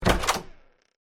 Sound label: open door; roblox doors